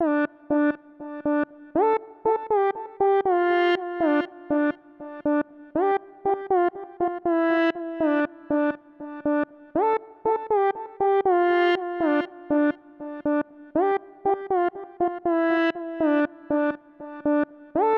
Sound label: music lead; EyeToy Play Astro Zoo; PlayStation 2